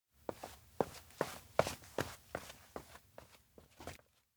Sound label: steps outdoor town leave girl; anemoi